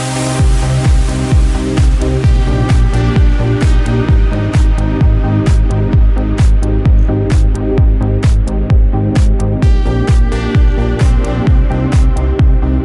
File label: bass world